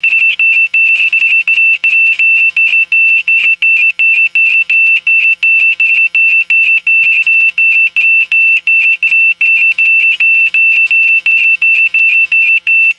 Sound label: high pitched electronic console alarm beeping; alarms